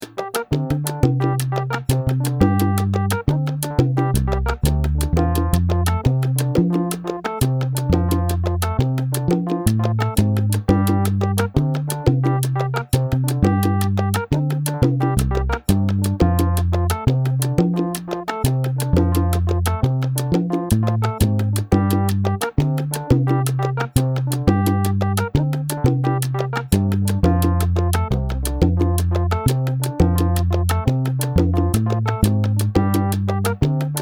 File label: base SALSA 2-3 Gm F